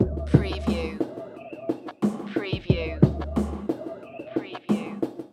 Hip Hop Drum Loop 90 BPM - Straight Lo-Fi

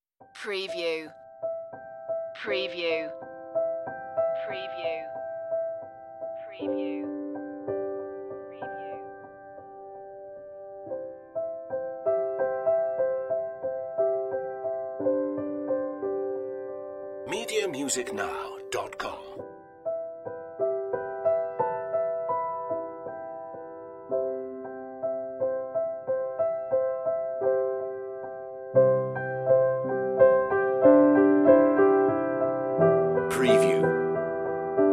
piano only

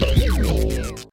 Hi-tech Panel Servo Button

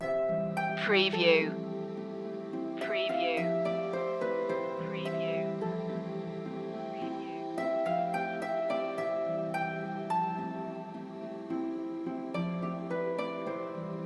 you and me no piano less lead

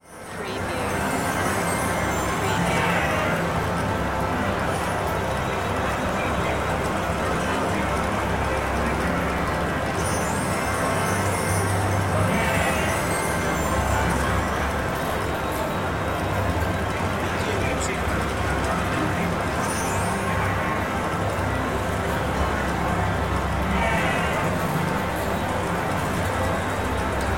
Ambience Casino

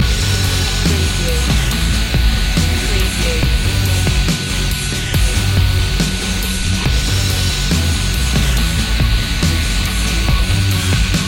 anvil loop

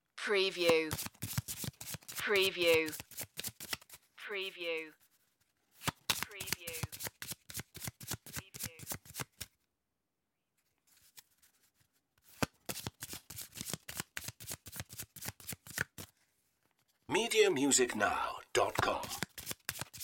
shuffle and deal cards

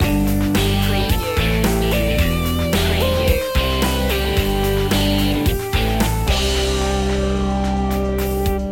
poprock loop

